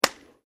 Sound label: PUNCH SINGLE; hand sounds; Punches and Hits; Sound Effects:Combat